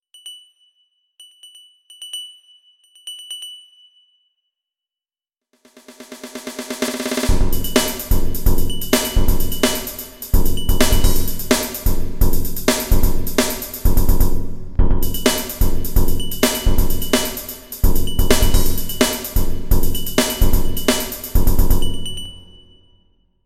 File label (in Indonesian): Nada Dering Nokia Jadul; Futuristico